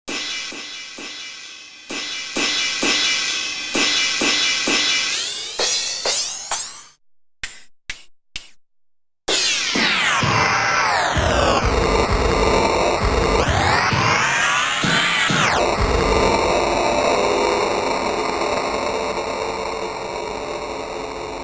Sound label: CY CRASH S 909